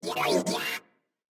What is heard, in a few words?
construction robot; factorio 2.0.55